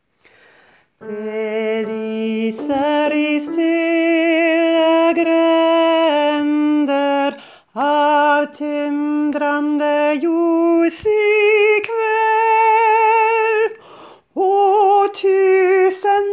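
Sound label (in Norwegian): Alt, Det lyser i stille julekveld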